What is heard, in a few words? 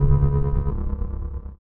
giant